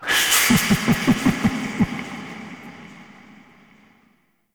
Dissolve; Warframe; Mods; Peculiar Mod

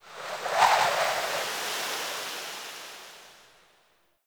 wind gust medium; Ambience; Warframe; Eidolon Plains

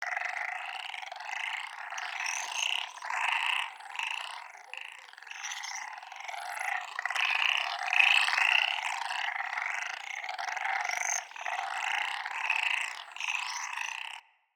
Critter Loop, Root Cave; Ambience; Neathuns Root Cave

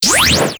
Fire; Warframe; Weapons

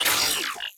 Ship Advert Hologram Off; Corpus Ship

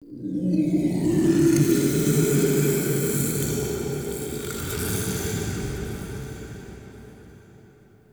Interior Texture Gurgle, Infested, Ambience; Misc Indoor

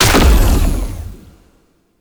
Stomp Impact; Warframe; Infested Lich; Hard Drive

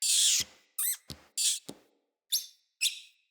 Deco Rat Idle B Variant Three; Ambient; Vocals; Verminia

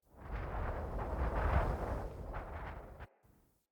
Fort Wind Gust Low Mid Howl; Ambience

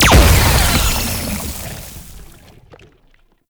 Infested Lich Summon Tentacles Slam; Warframe; Abilities; Zeke

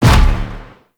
Void Dragon Orb Charge Explosion; Enemies; Abilities; Warframe; Duviri

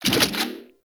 Pick Up Weapon; Warframe; Caliber Chicks Minigame; Pick Ups

